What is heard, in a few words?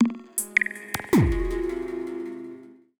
PERCUSSN, Ambient, Drum